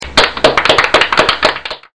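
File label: CLAPPING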